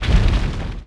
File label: Arrow Fire; Civ4 warlords